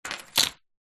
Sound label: vehicles keys car set down kitchen worktop